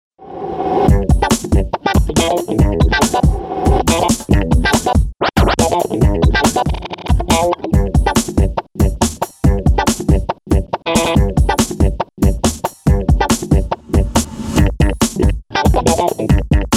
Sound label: wah n thump